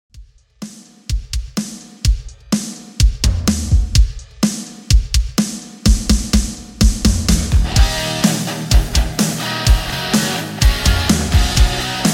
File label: 80s Rock Redrum 126Bpm